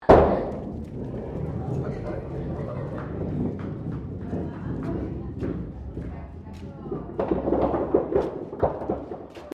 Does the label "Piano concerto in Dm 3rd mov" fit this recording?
No